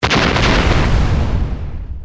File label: lava golem attack